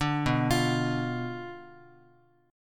B Diminished B down Guitar; Bdim arpeggiated; 5,7,8,x,x,x

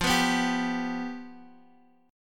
F Suspended 2nd Flat 5th, Guitar, Open D

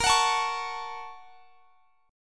Bb Minor Major 7th Sharp 5th; strummed; x,x,20,23,22,21

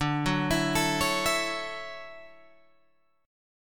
D-Major 9th D down Guitar Open G

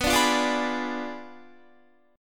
B Diminished 7th B down Guitar; strummed; tuning 4F,4A,5C,5G,5C,6E

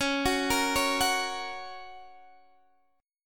F sharp Major C sharp

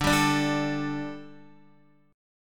D Suspended 4th D, down, Guitar; strummed; 4F,4A,5C,5G,5C,6E

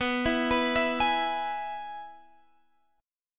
Ab Minor Sharp 5th B

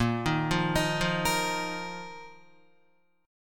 Bb Suspended 4th, Bb, down, Guitar; Bbsus4 arpeggiated; JGuitar

